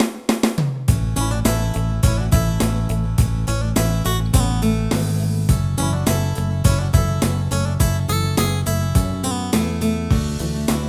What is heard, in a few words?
Bowling Results Screen